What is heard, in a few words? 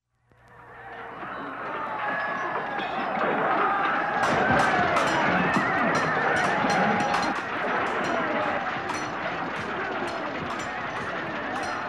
Medieval Battle; Stereo